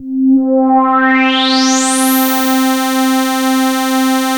MOOG, BASSES; Masterbits - Vintage Voltage; VV BASSES

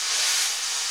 SNR XBRUSH, Snr Brushes; Brush&Rolls

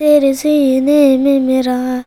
CHILD, Indian Traditions

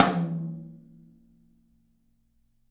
open tom; 3D Sound; 3D Drums; 10 TOM

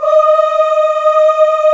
AFRO AH D#5, CHOIR AHS; Spectrasonics; Heart of Africa

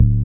SYN DANCE, Roland; Sound & Vision - Gigapack II

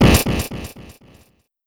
Criket Fx, Cricket

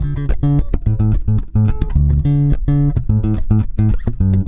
D#, Bass; Spectrasonics; Bass Legends; 117 CORAZON

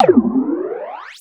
Rising FX, Classic SFX, cartoon; musicradar; cartoon caper

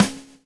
groove; AMG Gota Yashiki Groove Activator

